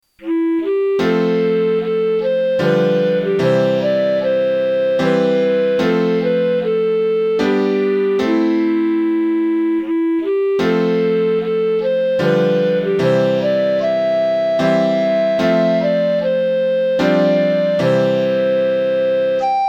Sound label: 3/4 Blue Melody Piano